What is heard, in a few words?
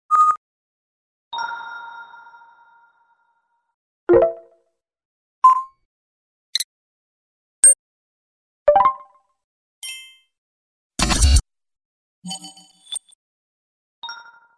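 high-tech user interface clicks sound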